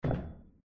step; csgo; eternity; scp; 049

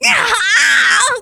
pain severe; femscout

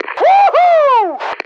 woohoo

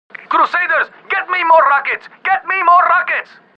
rocket; hl2dm

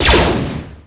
probe droid gun, blaster

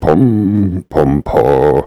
sing

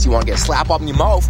slap mouth

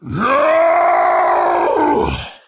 pain death critical; garrosh

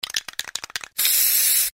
spraycan shake spray; csgo

